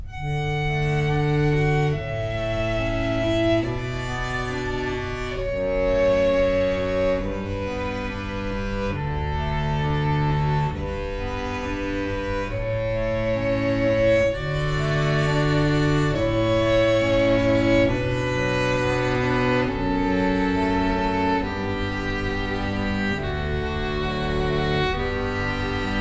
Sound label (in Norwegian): Canon Pachelbel for fiolin og trekkspill